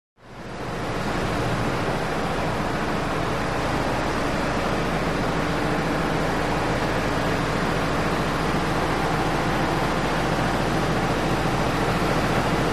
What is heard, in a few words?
Bus Idle Very Close Ai